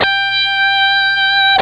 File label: guitar (g4)